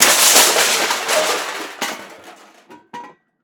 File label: Crash Metal Objects; crashes; AdobeCC